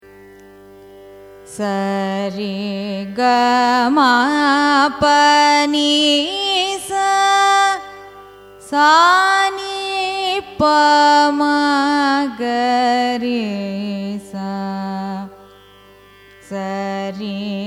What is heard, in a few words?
raga mandari arohanam avarohanam; carnatic music